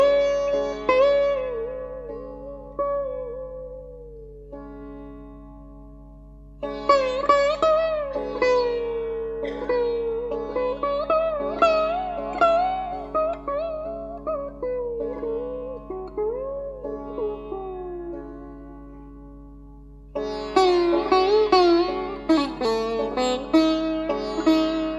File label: Sitar; zia mohyeddin show